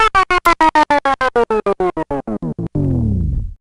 Gamebreaker Failed; nba street vol. 2

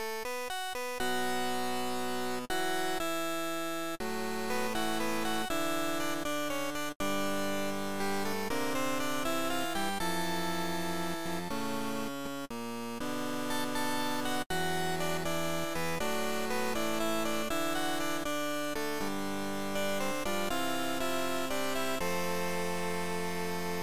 Ringtone; Gyakuten Saiban 3; GBA; Godot

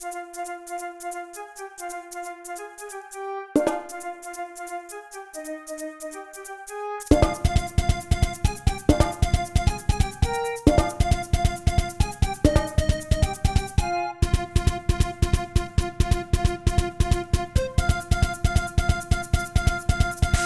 Jungle; Frogun

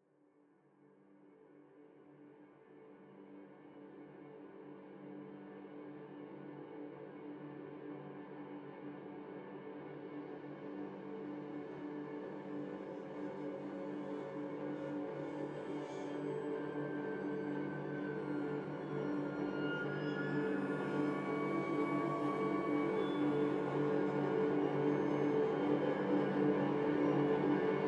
Savannah Music; Kenshi

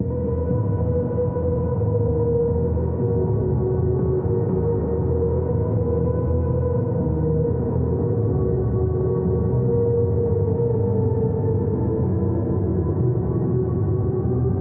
Music Positive Ocean VERB

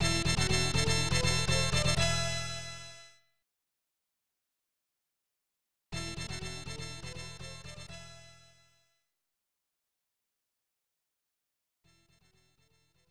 Item Collected; The Addams Family: Pugsley's Scavenger Hunt; SNES

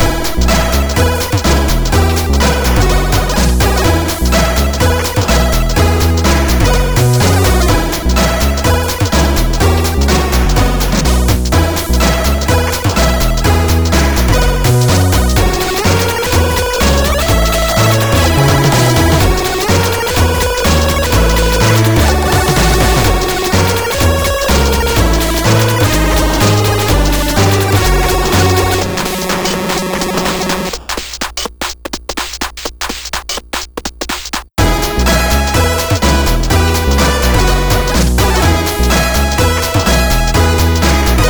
Character Theme 8-Bit; Awesomenauts; Yoolip

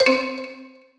Success Xylophone Two Note Decline Low Wet stereo; Obscure: Challenge Your Mind

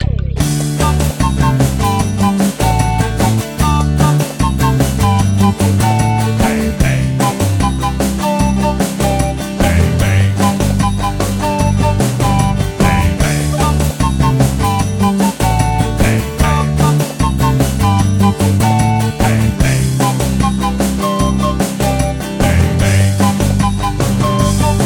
Jungle Music; Juju

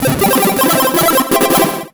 Solo Chip Arp Positive; MUSIC EFFECT; startide

